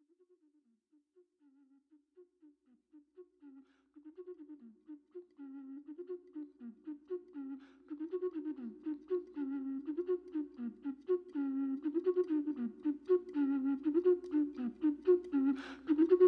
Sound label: Rain Forest chilean ocarina